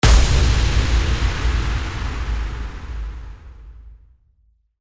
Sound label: IMPACT